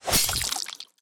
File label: knife stab